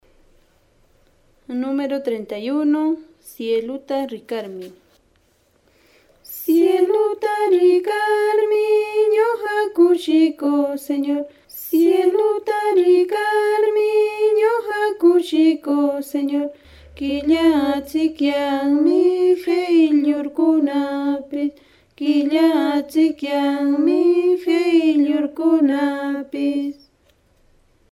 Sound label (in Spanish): cancion